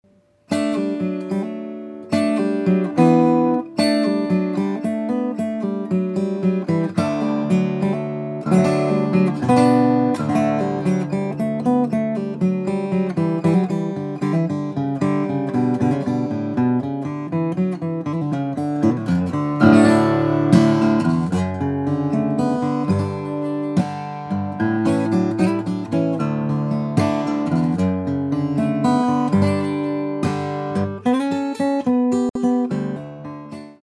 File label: Double 0 sample, guitar